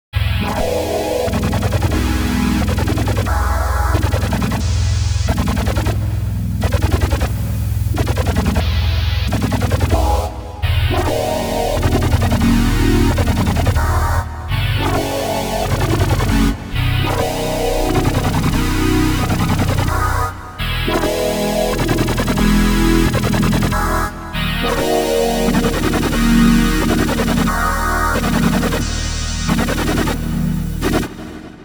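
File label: rhythmic saiph for chords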